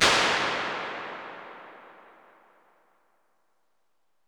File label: Concrete Room; Large Spaces; Rooms; Impulse Responses; Apple